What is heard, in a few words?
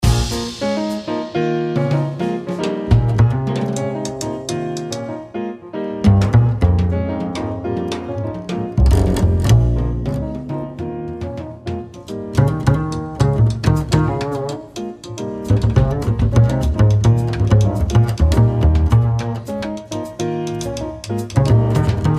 Bass Solo